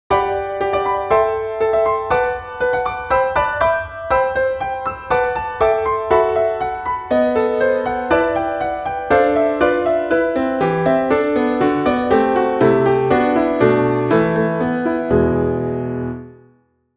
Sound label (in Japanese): Chotto piano